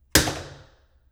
table foley; DoomRTX

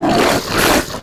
imp attack melee, monsters; Doom

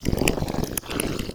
imp chatter, monsters; DoomRTX

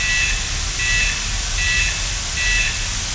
space gun cannon overheat; Quake 4 Alpha; id software; weapons; turret

